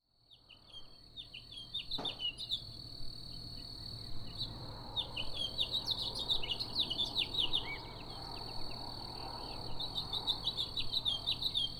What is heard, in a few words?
morning, morning ambience